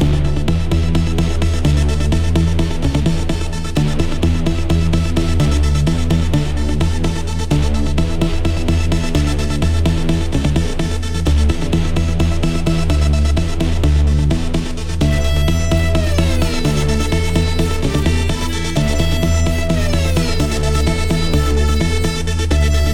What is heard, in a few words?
robotic; zx robotics byte sized heroes